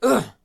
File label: Male Hurt